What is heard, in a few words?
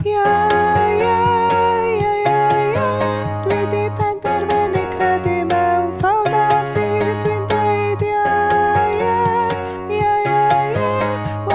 Soprano